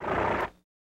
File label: Horse Blow Friendly